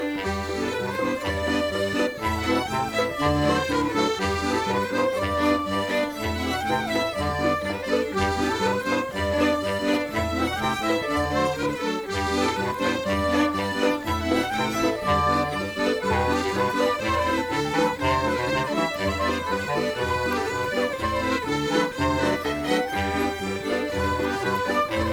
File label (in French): bourree carree, folk